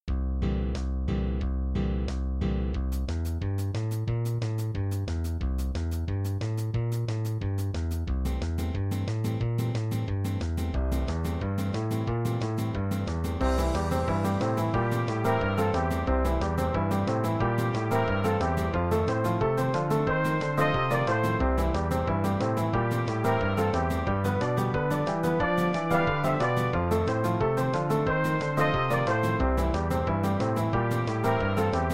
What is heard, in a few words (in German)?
Boogie C Dur